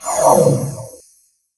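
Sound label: Goblin Mage Buff Skill; Seer; GamigoNA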